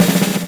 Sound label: DRMROLL; E-Lab; X Static Goldmine 2; akai